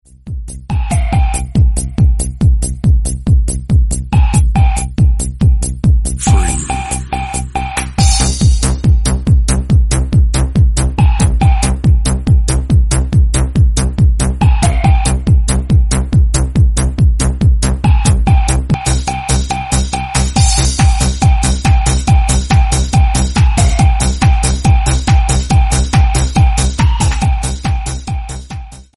nice background music tone ringtone; samsung ringtones

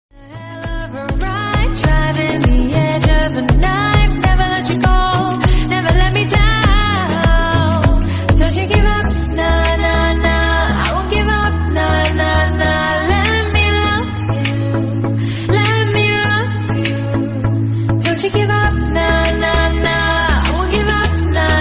romantic female song tone ringtone

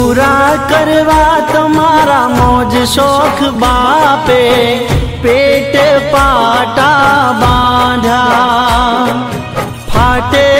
gujarati ringtone

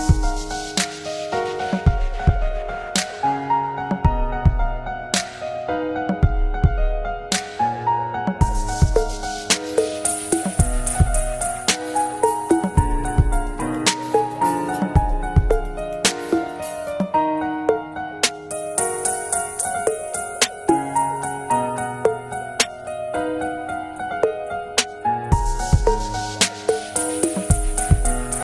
english bgm ringtone

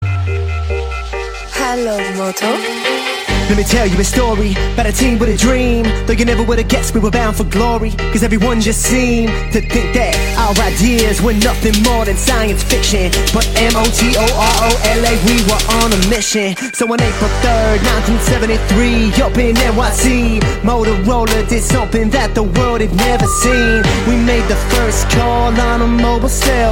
motorola ringtone

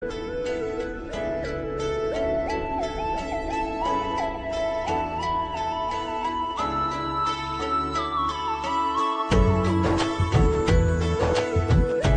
flute ringtone; mausam

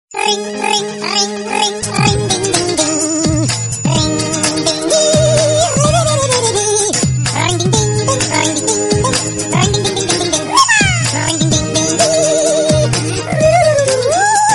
cartoonic funny tring tring song tone

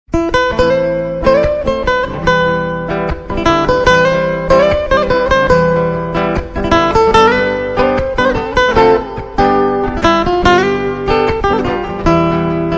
iphone3 guitar ring ringtone

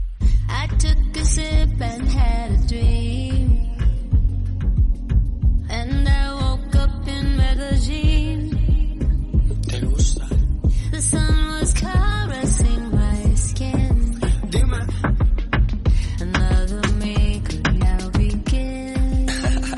english song tone ringtone

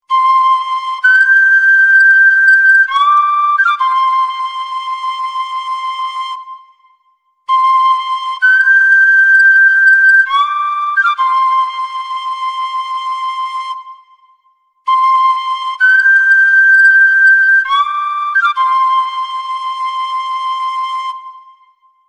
sound of irish flute ringtone

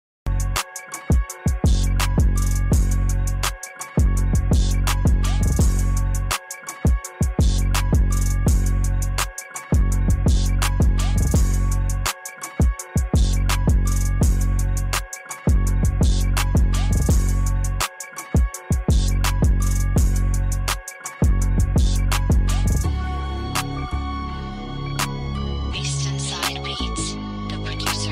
type beat ringtone

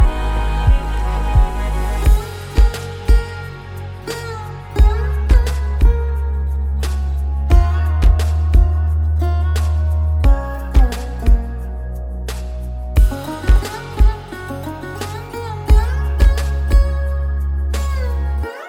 music ringtone

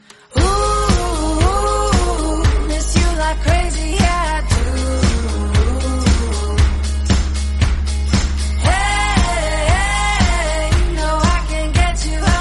English song tone ringtone

